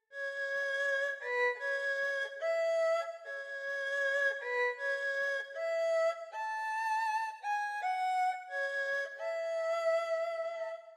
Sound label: Mini Erhu